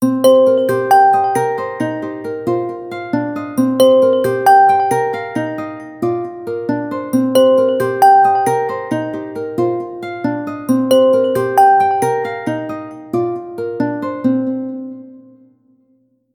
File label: ringtone; xiaomi